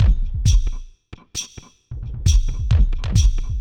thump 133

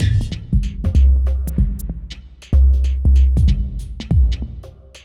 Deep Mover 95

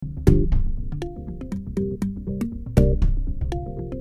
Mallimban C7 120

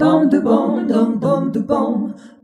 Jazzy Harmony

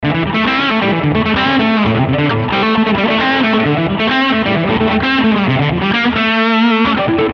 130 Chorus Alt Rocker G

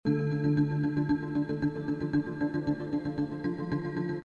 Dm7 115

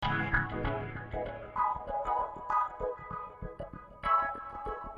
Swinglefunk E

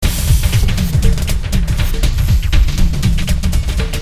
Film Tension 120

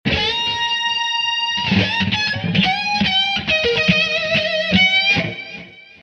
Eb 100-120 solo

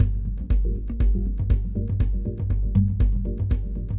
Mallimban Gm7 120

